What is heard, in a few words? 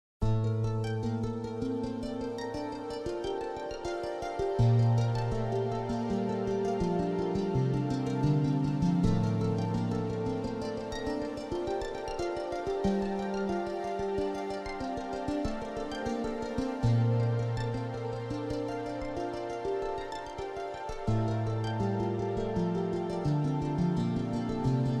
Enchanted Harp